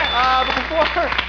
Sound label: ding